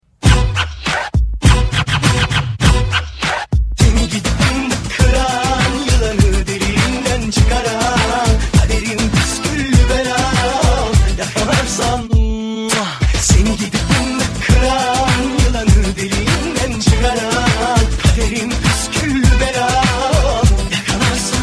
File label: turkish mix